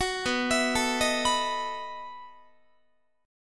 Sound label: B 11th Guitar; arpeggiated; 21,19,19,19,19,22